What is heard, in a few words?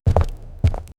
deep sound of heavy boots, cave